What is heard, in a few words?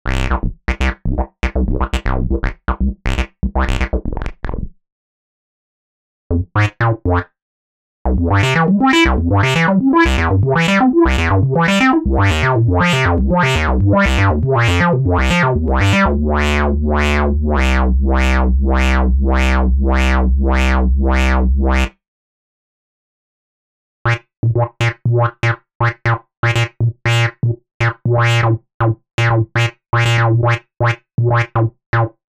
Freeroll Sharp Wobble